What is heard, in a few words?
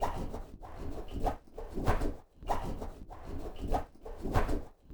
RUSH; Spectrasonics; Liquid Grooves 1 & 2; ODDS + ENDS